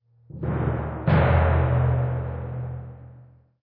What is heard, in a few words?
Tymp Hits